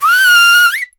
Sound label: flute high pitch cry; instrument